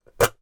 household safe small desktop metal key out of lock